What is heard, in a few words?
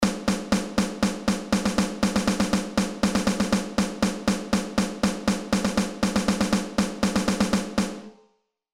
Rhythms Snare Drum